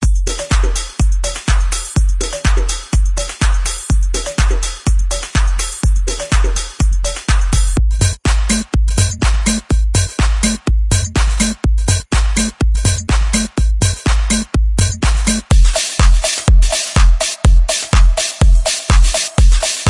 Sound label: future deep house drums